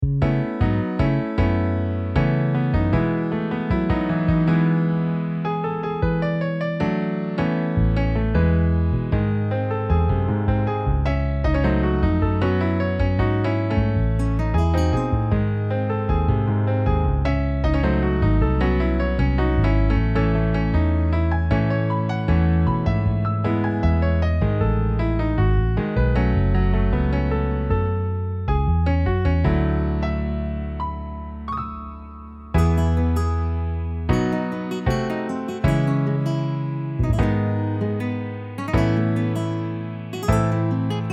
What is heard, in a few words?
Piano Bass; MIDI